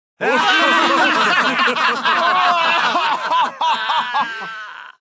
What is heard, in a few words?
laugh; Age of Empires II HD; taunt